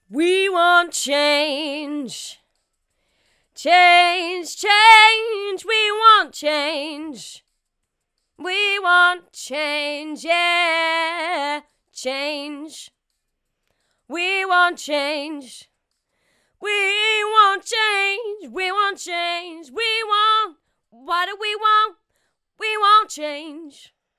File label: chorus dry; waves